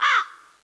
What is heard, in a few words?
CROW